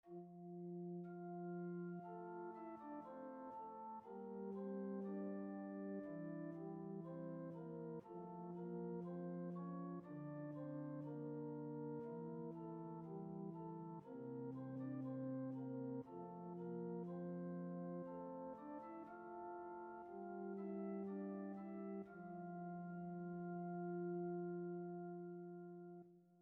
Zweistimmig Funfte Gattung

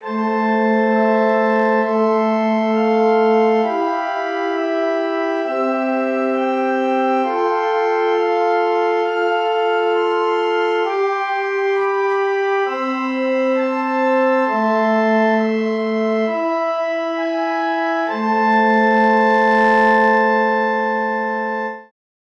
Stimmiger Contrapunkt; Ronge

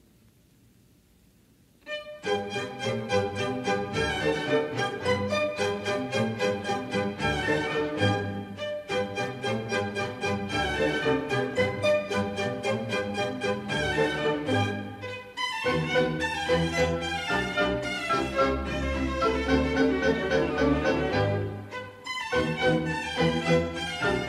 Deutsche Tänze für orchester

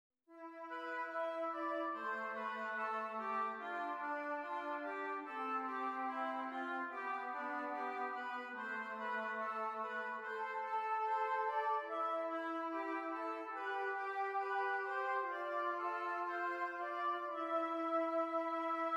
Zwei simmiger Contrapunkt; Ronge

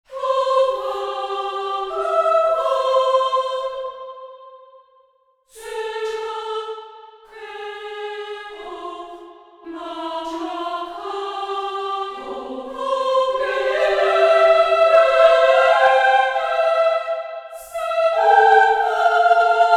Zweistimmiger Kanon; Hoffmann sei ja kein Hofmann

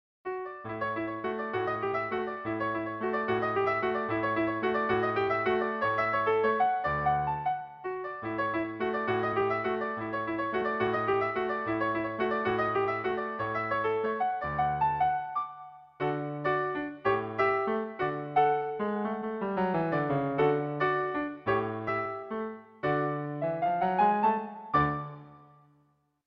ländlerische tänze Klavierübertragung; Sieben ländlerische tänze vermutlich für zwei Violinen und baß; Extra Tänz

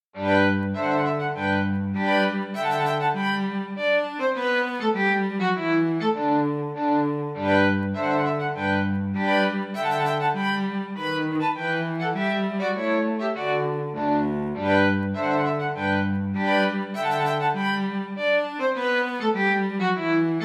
Menuette fur zwei violinen und Bas Menuett; sechs Menuette

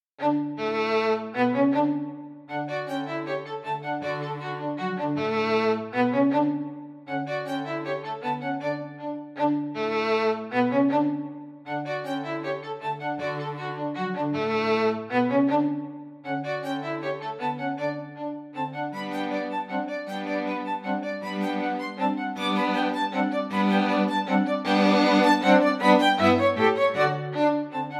Landlerische Tanze fur zwei violinen und Bas; Sechs Landlerische Tanze

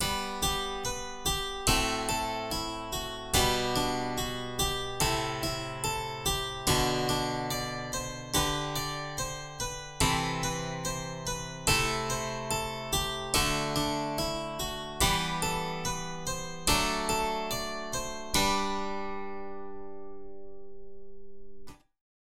Stimmiger Contrapunkt; Ronge

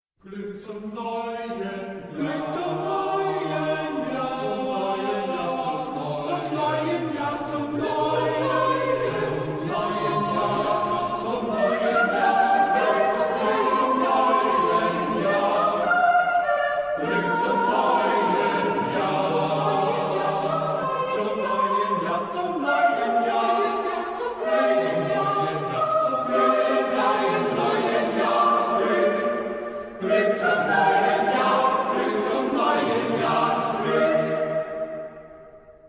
Vierstimmiger Kanon; Gluck zum neuen Jahr

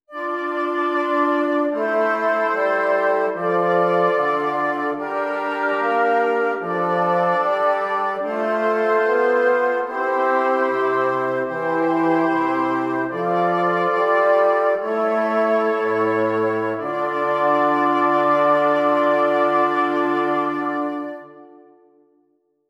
Stimmiger Contrapunkt; Ronge

